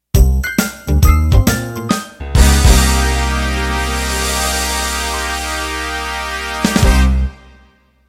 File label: 50s Rock End